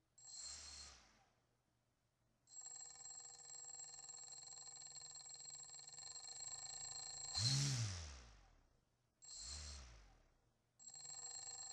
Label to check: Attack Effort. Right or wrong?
Wrong